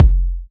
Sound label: Deep Kick